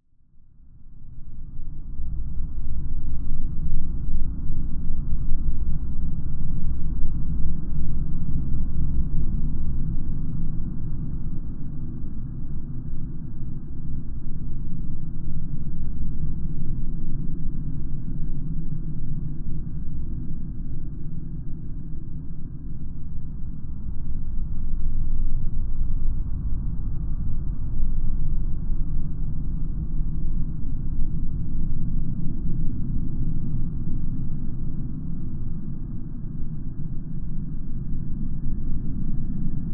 science fiction atmosphere cold desolate wind rumble